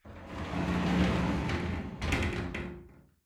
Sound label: bathroom sliding glass shower door close